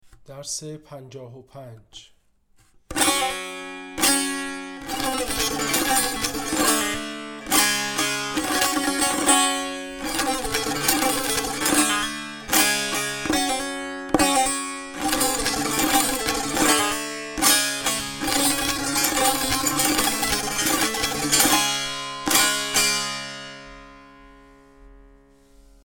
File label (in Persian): مشق تنبور